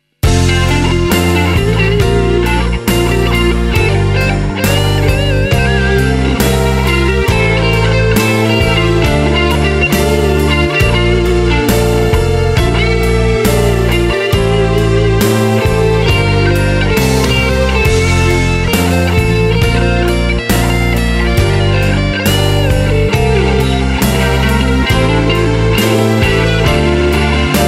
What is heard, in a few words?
Blus, Fender Strat74